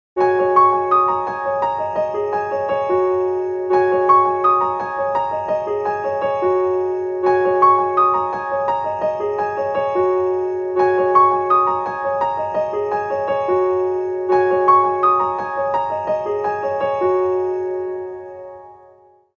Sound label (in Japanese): ピアノの速い音節と鈴, 発車メロディー; 山手線; 新宿; 電子ベル